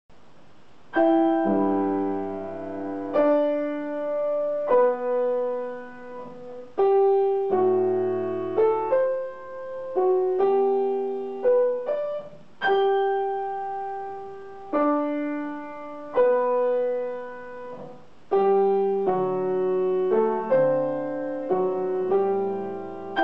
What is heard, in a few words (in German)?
Adagio in G Dur fur Klavier